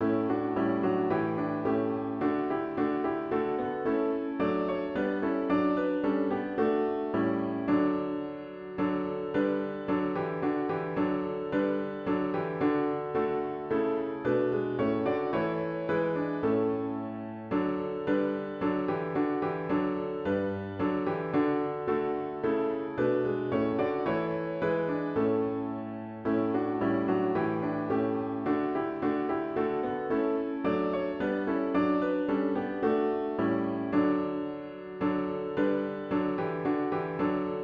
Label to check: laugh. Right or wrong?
Wrong